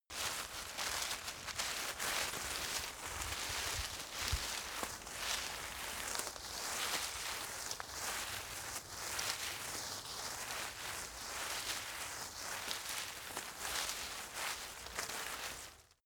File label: rustle foliage; berufe; foerster